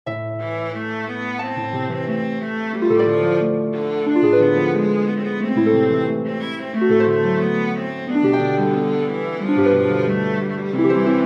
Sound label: recitativo for viola harp; Johann Sebastian Bach; geht denn hin